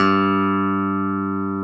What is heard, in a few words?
G2, CLAV; Sonic Reality; Vintage Timetraveler; CLAV PIANET